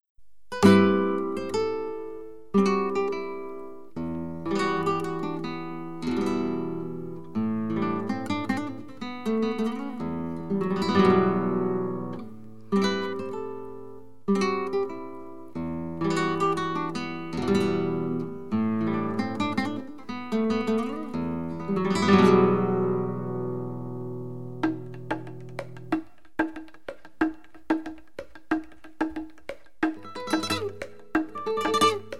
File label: Rumba